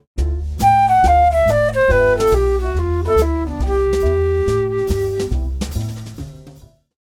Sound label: G7 Major Bebop Scale Two five